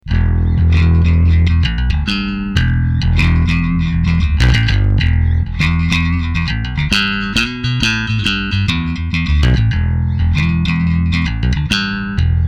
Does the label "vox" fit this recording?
No